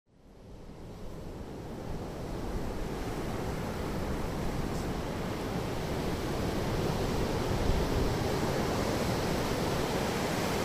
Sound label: soundscape sea beach; banjan beach